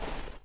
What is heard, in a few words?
dirt jump, footsteps; chief